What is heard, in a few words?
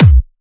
Trance Kicks, Bassdrums